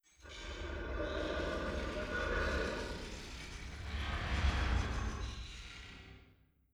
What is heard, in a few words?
distance technosphere closing; Stellaris; guardians